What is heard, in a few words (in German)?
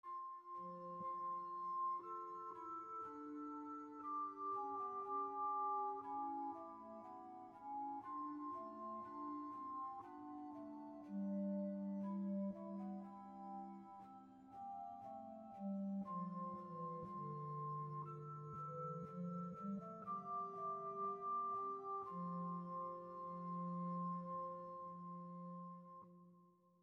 Zweistimmig Funfte Gattung